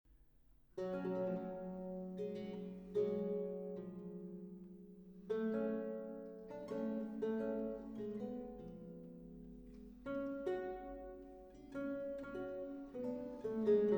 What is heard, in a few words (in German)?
Solo 5, B-Dur für Oboe & B. c.